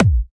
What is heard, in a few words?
short kick sample d key